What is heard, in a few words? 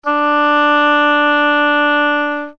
oboe d4